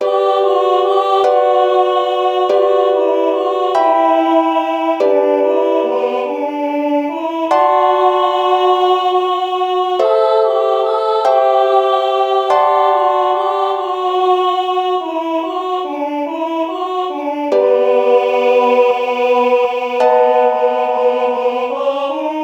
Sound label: UKE, ukulele